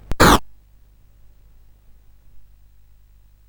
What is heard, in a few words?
sid CSG8580R5; California Games